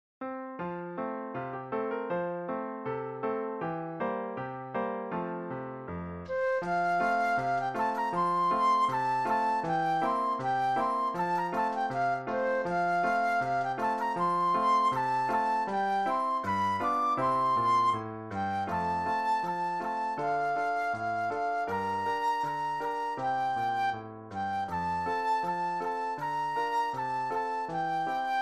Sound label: Hunters Chorus Flute Solo; classical; Weber